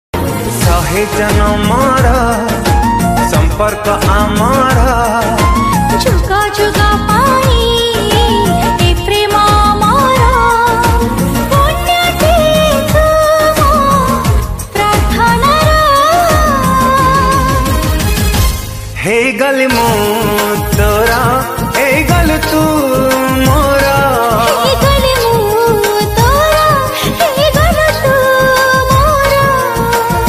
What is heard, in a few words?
Odia Ringtone